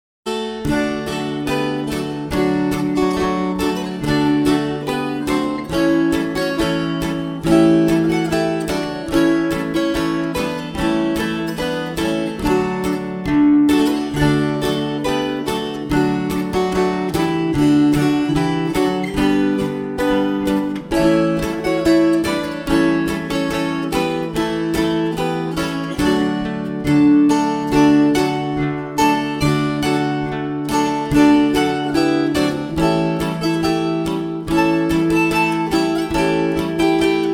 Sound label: Chinese Breakdown fast